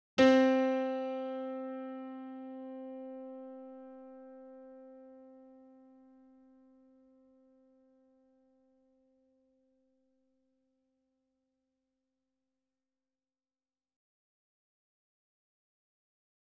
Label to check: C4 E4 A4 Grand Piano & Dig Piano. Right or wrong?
Right